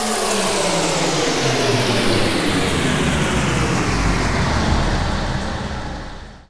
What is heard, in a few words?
sfx sci fi; Masterbits - Switch FX